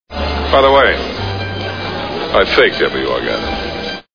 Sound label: orgasm